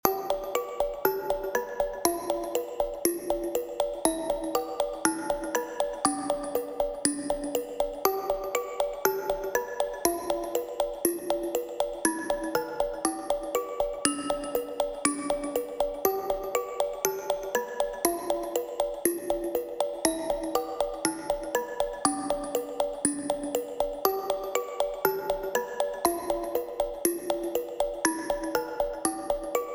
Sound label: Synth Loop; Downtempo; Hive